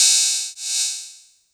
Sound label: Hihat open